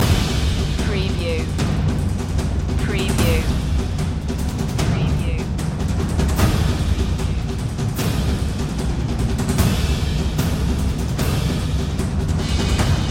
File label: forged in fire percussion loop